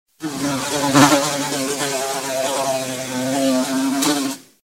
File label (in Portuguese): moscas voando